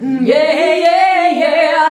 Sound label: VOX, Singin